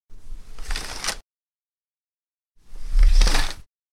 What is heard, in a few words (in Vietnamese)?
tieng rem cua mo ra va dong lai